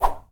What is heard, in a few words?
Woosh Short, Light